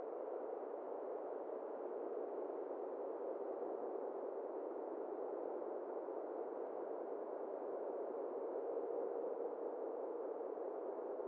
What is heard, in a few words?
winter lp, ambient soundscapes; dota